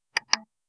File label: a quick singular satisfyi, button